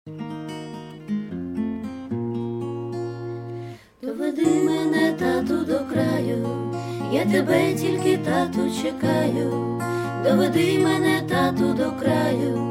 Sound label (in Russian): Песня на укр. яз.